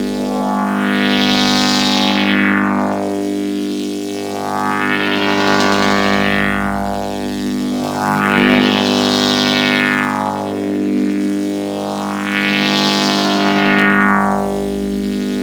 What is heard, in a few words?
Shield Loop; Warframe; Volt